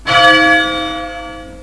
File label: Bell; OldBells